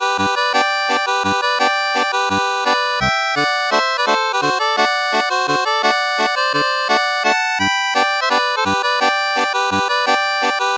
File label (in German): Liederweisen 2 Harmonikas 1 Stimme